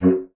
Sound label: bassoon F#2; Xsample VOL-6